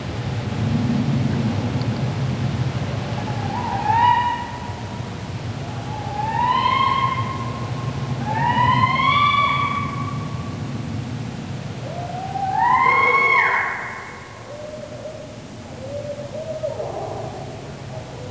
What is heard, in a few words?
Scream